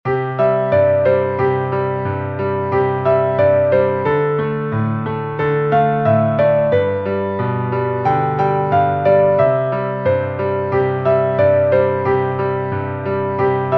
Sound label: jingle bells; pierpont james